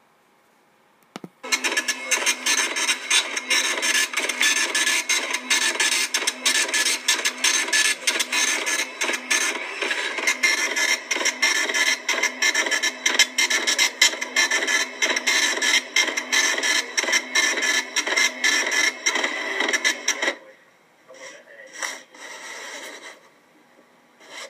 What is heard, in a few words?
Dot Matrix Printing Sound